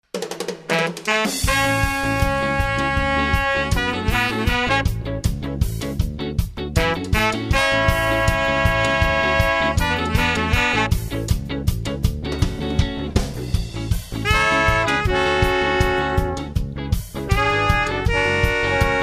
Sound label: Ska, horns